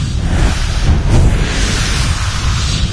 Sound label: idle, lostsoul; doom3